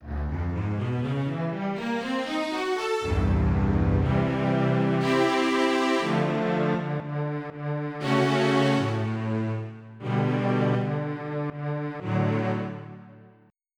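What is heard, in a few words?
Tremelo Strings; FF7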